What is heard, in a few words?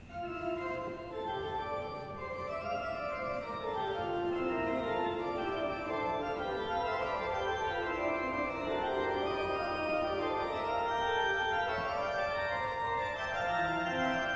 fugue